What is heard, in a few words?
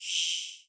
cicada single